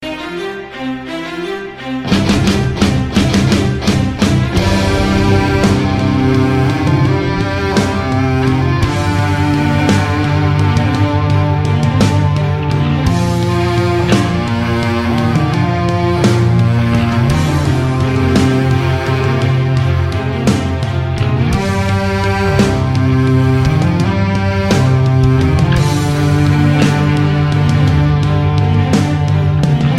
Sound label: Metal